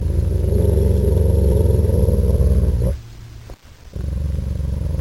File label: Growling Wolf